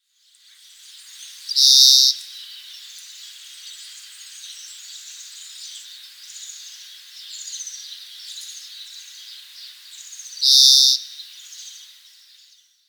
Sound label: Chloris chloris; SETE